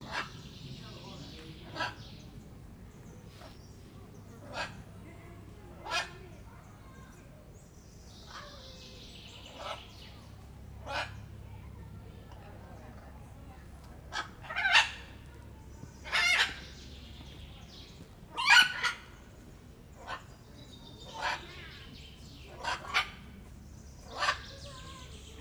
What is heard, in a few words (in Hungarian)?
nyekereg, sárgakék ara; szegedi zoo 2011